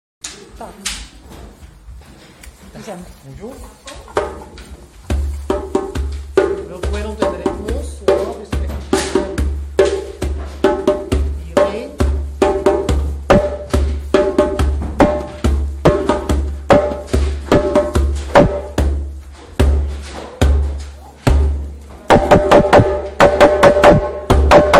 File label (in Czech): Bubny, djembe